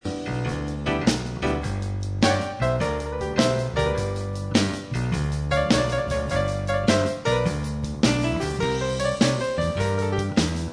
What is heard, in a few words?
Blues